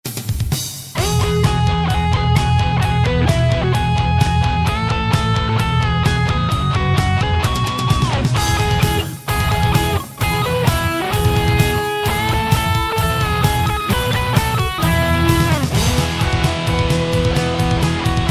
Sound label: Ice War Music